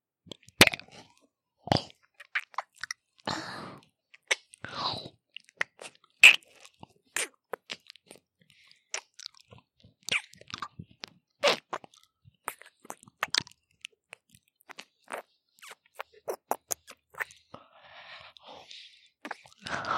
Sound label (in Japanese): 耳舐め, ASMR